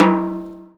TOM TOM; E-Lab; X Static Goldmine 2

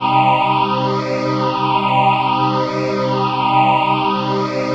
PHASE PAD, ATMO PADS; Best Service; XX Large

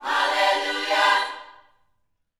YOUTH CH PHRASE, Vocal Planet Gospel

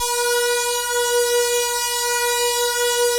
ATMO PADS; Best Service; XX Large

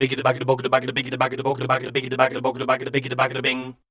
SCAT C, Voice

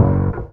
ORG TON; E-Lab; X Static Goldmine 2; akai

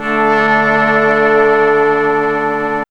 CHORD

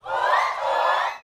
SHOUTS, Gospel

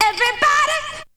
VOC

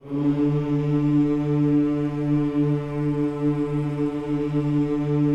HMMS D 3, Gospel; Spectrasonics; Vocal Planet Vol.1 Gospel; akai

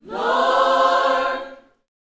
Vocal Gospel PHRASE

